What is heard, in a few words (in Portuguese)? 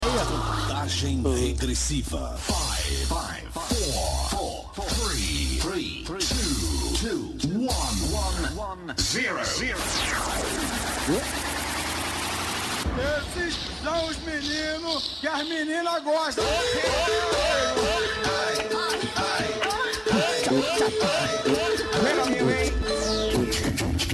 abertura